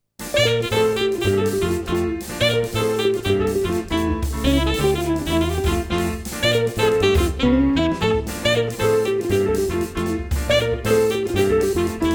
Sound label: old chords